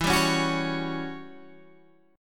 E Minor 6th Add 9th, Guitar, Open G; chord shape

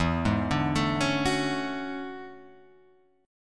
E 13th, Guitar, Half Step Down

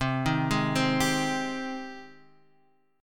C Minor Add Flat 6th C; maddb6:1,b3,(5),b6; Cmaddb6 Chord {X 3 1 1 1 3} arpeggiated

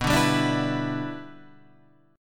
E Minor 7th B

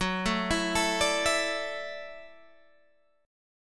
Gb Minor 7th Sharp 5th, Guitar Standard; arpeggiated; JGuitar

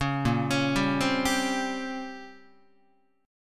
Bb Minor 6th Add 9th Bb down Guitar; arpeggiated; JGuitar

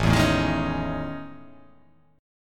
A Major Sharp 11th

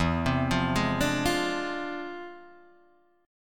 E 7th Flat 9th Sharp 5th; arpeggiated; chord; JGuitar

